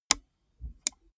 gaming mouse left click single slow; maschinen; buero

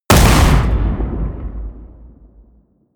blast, hits explosionen metall

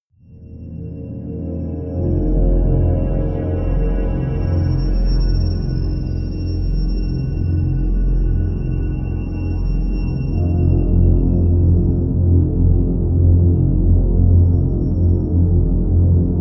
drone dark glitches

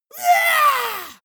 monster growl attack high; schreie

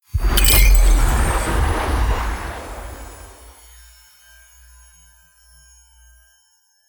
magic spell storm wind bells; swooshes-swishes

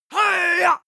male attack shout long; schreie; menschen

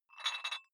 dumbbell take off rattling weight, fitnessstudio

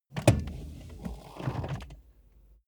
car bmw e46 interior seat push back short

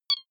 click electric pebbles tonal high

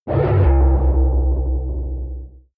protective shield hit absorption short, energie

